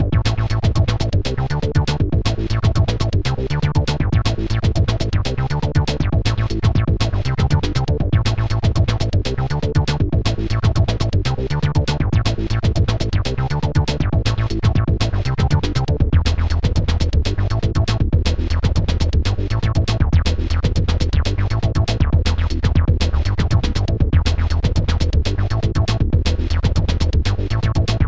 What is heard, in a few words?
120bpm, loop; Digital Culture Stream - Volume 4A